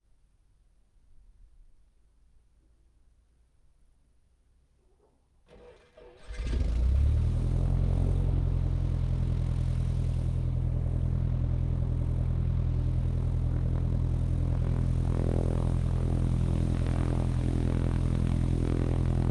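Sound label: cessna 172 exterior idle start idle; fvtt sonniss gdc audio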